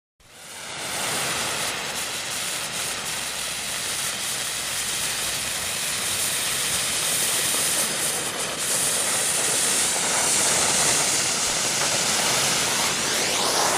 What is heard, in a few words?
Street Flare Burning, Phased Changes As Fuel Burns